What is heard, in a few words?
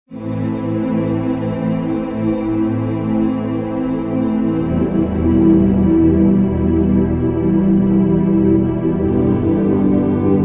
Underscore PAD; Worship Flow